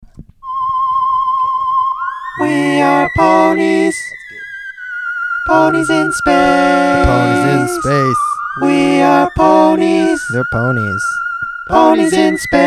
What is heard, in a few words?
the celestial choir of ponies